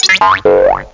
spring

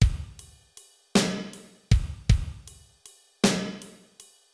Basic Beat Ride; Pro Tools Blues

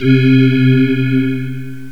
space voice, synth pad; GMPlay

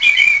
birds; Jump Zampoli

